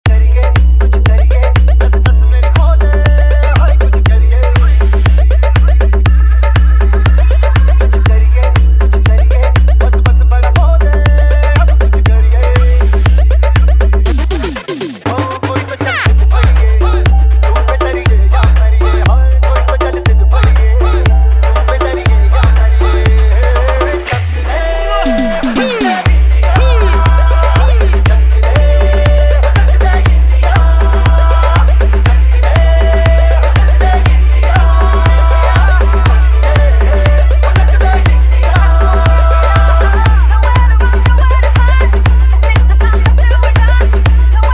Humming Dance Mix; Cricket Special IPL Music Top Hit

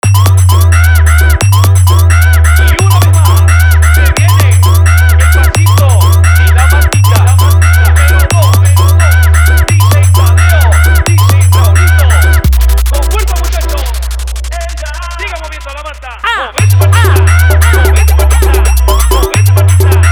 New Style Frog 1Step Humming Mix; Ribalbata

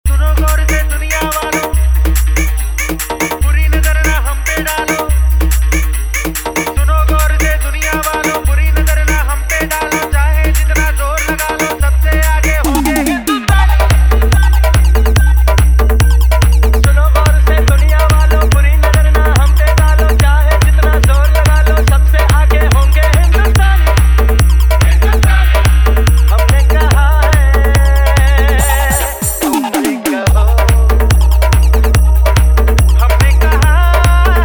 Cricket Special IPL Music Humming Dance Mix

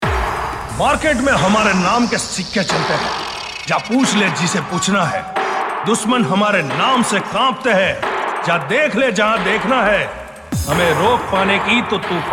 Humbing Bass Ambulance Hron Animal Sound; Dailock Competition Mix 2024

